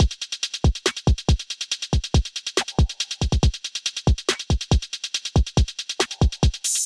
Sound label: MPE Surge Kit, Trap Drums; Trap Drums by Sound Oracle; Ableton; Factory Packs